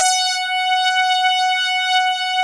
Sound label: Saw Lead F#; SYN JD 2; Best Service Gigapack 1